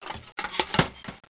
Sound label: tool open; Presenting OMO